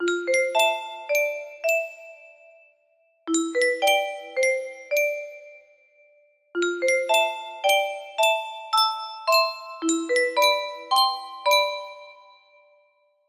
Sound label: maple thing, music box; music box maniacs